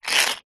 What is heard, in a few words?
money coin australian 50 cent slide short on tiled table; foley